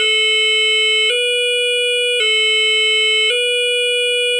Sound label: France Firefighter; Tonfolgen Hella; Dachbalkensystem RTK 7 Konfigurator